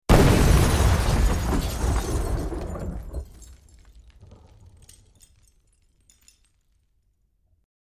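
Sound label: Explosion Glass Debris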